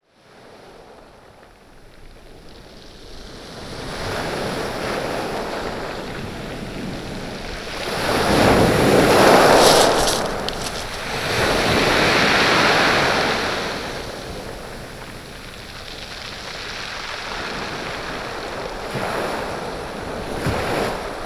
Seawash In Waves Heavy Stoney Binaural, Bishopstone Beach; Ambisonic; Ambisound